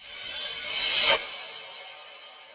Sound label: reverse